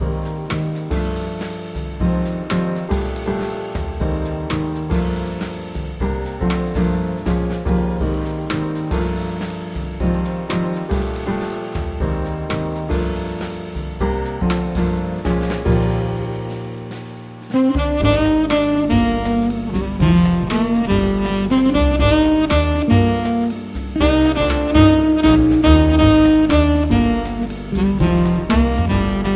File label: Jazz